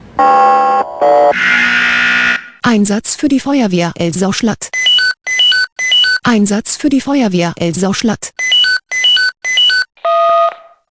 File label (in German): SMS Alarmierungstone; FW Elsau Schlatt